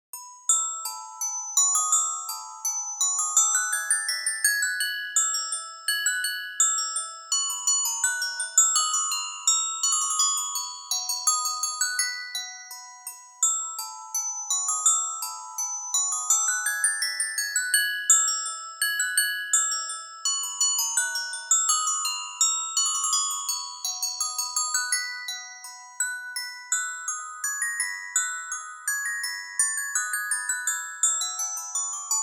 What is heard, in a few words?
March in D Major; BWV Anh. 122